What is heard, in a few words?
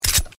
g19 switch; weapons